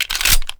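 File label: pistol reload empty mag in; gmod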